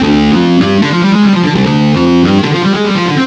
Heavy Synth Riff

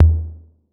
808 SOFT; Wizoo - Platinum 24 Electronic

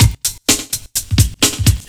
ELECTRO; USB Soundscan vol.25; Big Beat Drumloops; AKAI

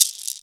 IN SHAKER; Ragga & Dub Reggae

